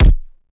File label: KICK kinda acoustic kick